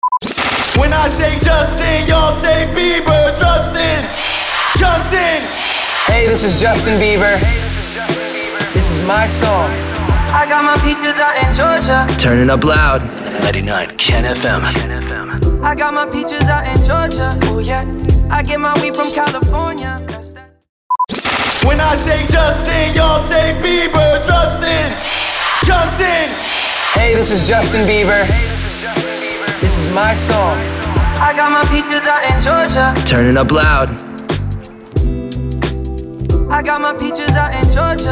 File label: SWEEPER POWER INTRO 90BPM